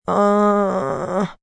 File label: Moan Sick, Moan, groan, female; Groaning Sound Effects; VoiceBox; Twistedtracks